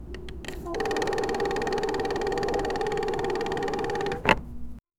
the sound that manual and, media

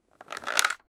a scene an archer slowly, bow and arrow

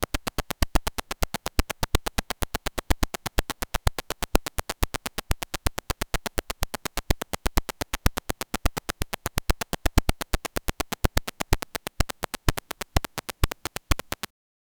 the rhythm accelerates, acceleration

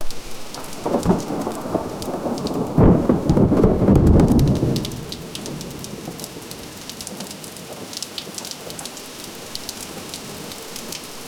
heavy rain water splash, rain

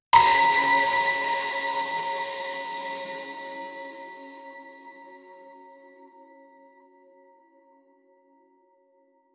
epic conch shell blast, spiritual

